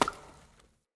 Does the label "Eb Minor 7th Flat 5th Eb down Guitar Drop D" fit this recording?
No